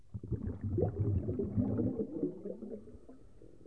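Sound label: bubble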